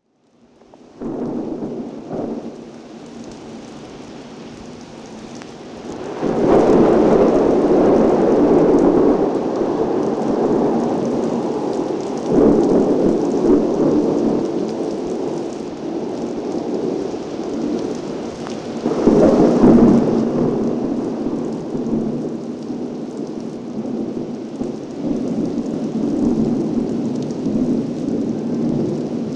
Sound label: thunder